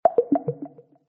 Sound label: sound fail; Wheel Race